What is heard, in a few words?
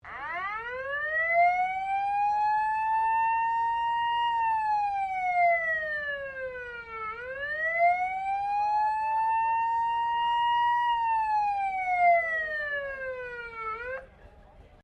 SIREN FIRE ENGINE, Old fire siren; MFB 1969; Sirens; Electronics